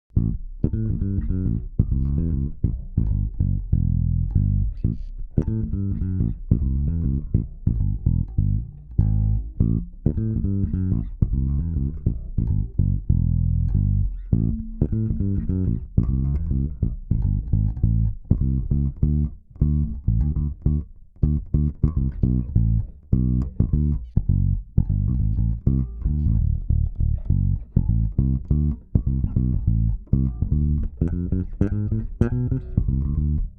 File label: Bass, Comp